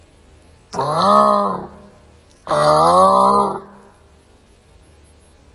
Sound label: Pachycephalosaurus, dinosaur roars